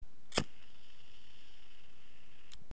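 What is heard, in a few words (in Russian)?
зажигалка чиркнули загорелась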